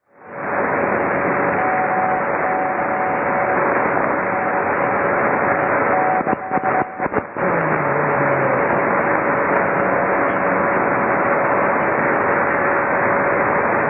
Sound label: 6220kHz Mystery Radio